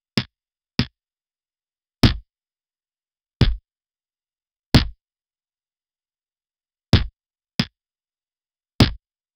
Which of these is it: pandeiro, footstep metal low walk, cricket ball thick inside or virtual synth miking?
cricket ball thick inside